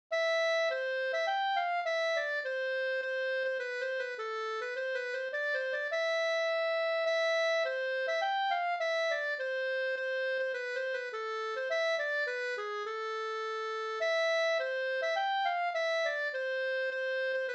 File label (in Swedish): polska Bodapolska